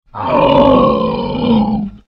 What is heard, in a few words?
pain, troll